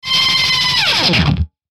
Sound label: Electrical Filter Power Down Buzz; Blastwave